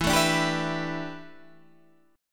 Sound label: A 9th Suspended 4th E